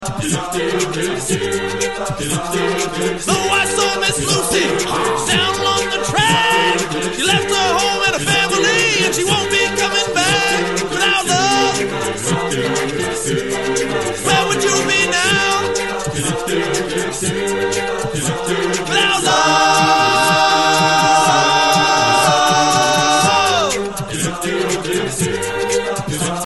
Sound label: Long Train